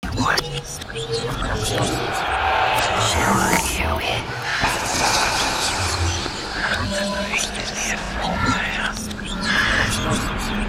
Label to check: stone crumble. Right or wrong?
Wrong